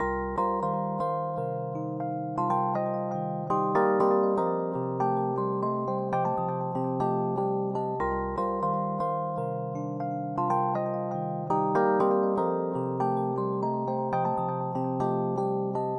lizard chords; Polyend; Loops n trouble

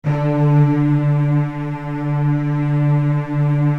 Virtuoso Strings, D2; ILIO; Kirk Hunter Virtuoso Strings VOL-2; 90 s Sample CDs

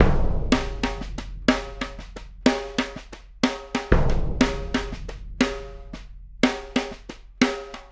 Big Drum and Snare

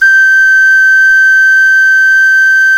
CLAYFLUTE, Heart of Africa

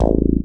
Ind Bass (A), Synth Bass

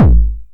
909 BD Tape Sat

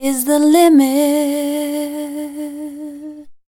FEMALE, Dance Vocals

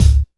Kick, Alesis DM5

